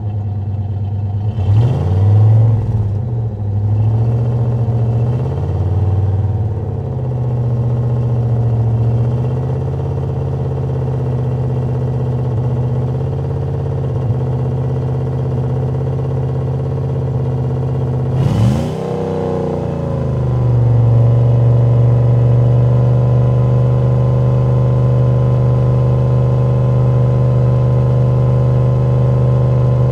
Truck Durango Fast Idle Off; Trucks

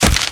Melee Physical Medium; Kilta

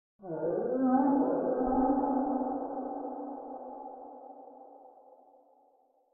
Wolf Howl Eerie With Delay; Hollywood Edge; sound designer's toolkit v2

